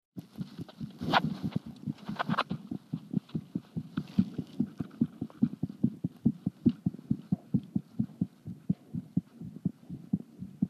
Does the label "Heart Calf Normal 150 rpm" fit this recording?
Yes